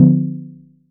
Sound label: Chord; ModelCycles